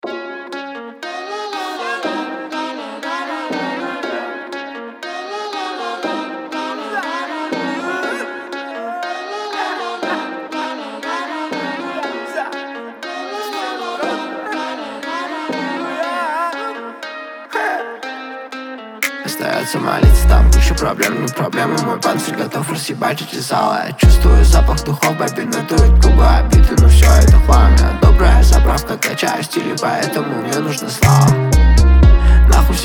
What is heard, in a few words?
Razbityj fonk; bity dlya repa